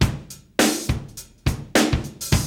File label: break; ZERO-G; Datafile